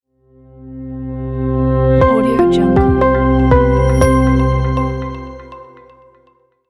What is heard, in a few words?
simple logo